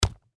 Baseball Glove Catch; Baseball Glove Catch Ball Thud; Sports

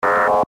Sci Fi Beep Dirty Digital; Tech; Blastwave

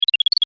Science Fiction Beeps Chime; Tech

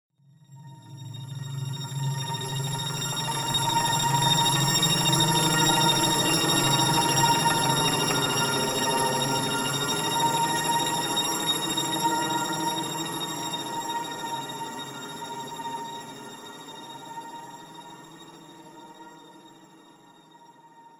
Shifting Space Sands, sci fi music; science fiction sound effect

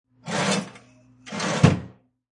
A cash register is opened and closed.; Cash Register Sound Effects; Bars

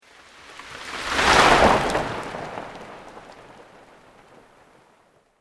Sweep Organic Slow

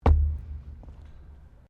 METAL IMPACT THUD; Materials

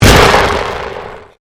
Convolution Pulse

